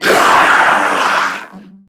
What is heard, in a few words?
Death; Warframe; Corpus Amalgam Sniper; Dialog Barks Battle